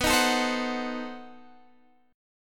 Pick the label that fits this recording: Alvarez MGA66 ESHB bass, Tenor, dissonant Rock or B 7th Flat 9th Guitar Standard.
B 7th Flat 9th Guitar Standard